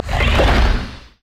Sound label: pain; LoL; trundle